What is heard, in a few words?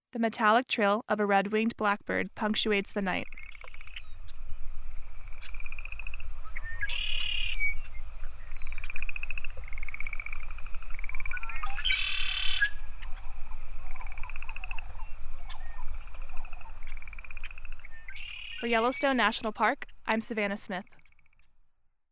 red winged blackbird; sound and light ecology team